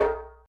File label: Djembe